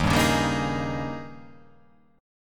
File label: D sharp 11th